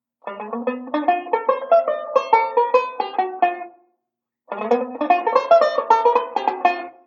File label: ii V Pattern, banjo